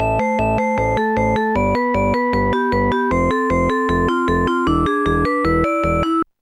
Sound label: rock seven; Instant Music